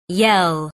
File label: yell